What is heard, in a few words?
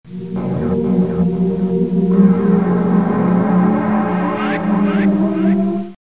Moan